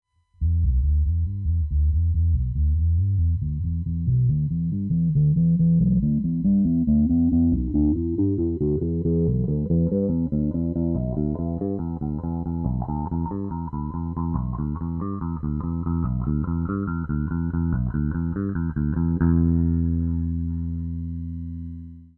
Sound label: wahoo res